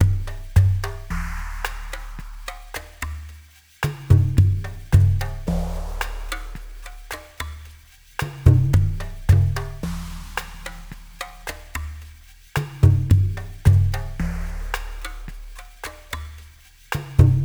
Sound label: PERC MX; Spectrasonics; Liquid Grooves 1 & 2